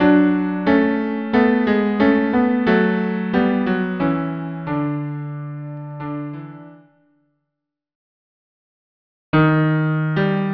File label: Chorales cello duo; Two Bach Chorales